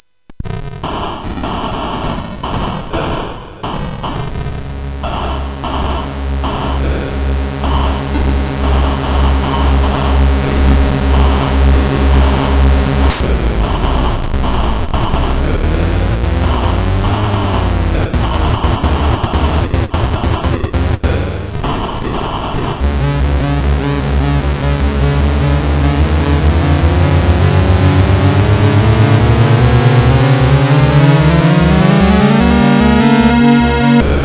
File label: Battle Tune, CSG8580R5